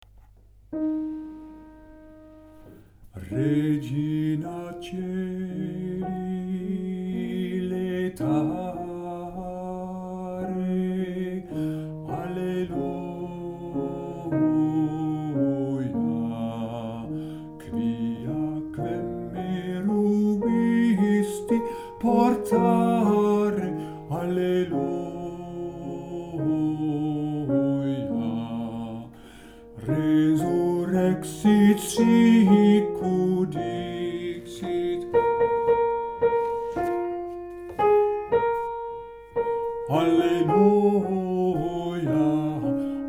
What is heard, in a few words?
alt regina vogel